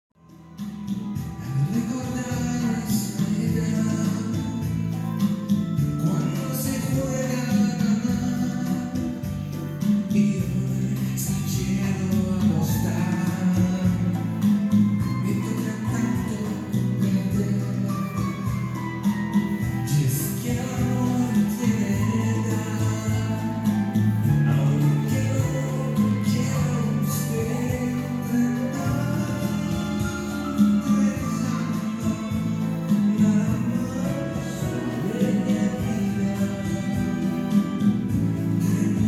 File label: Rumba